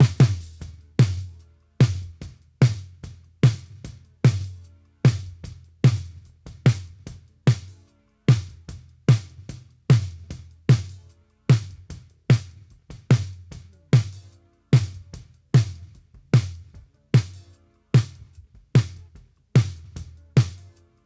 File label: Snare